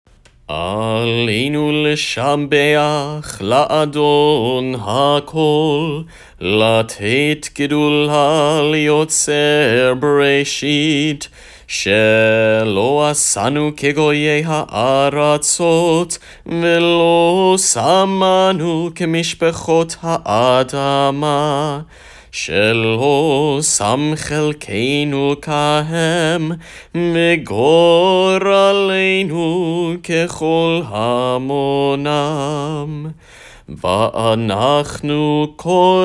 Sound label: Aleinu Sung